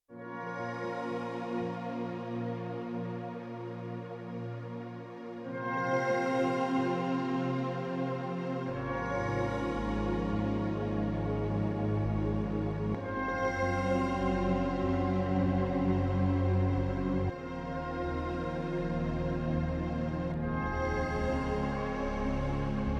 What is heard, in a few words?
Slow Attack, Spacey Keys, Yamaha TX16W; Spacey Keys 2